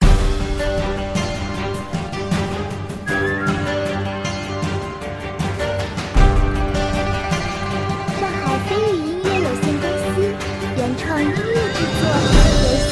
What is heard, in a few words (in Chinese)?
激烈 紧张 战斗中国风